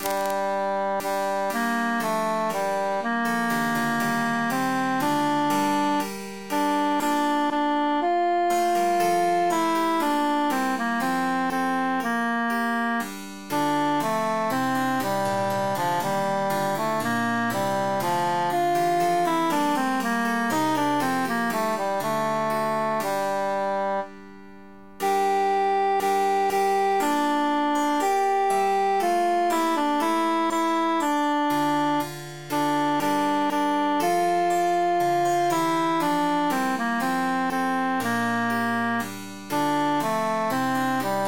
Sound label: tenor emphasized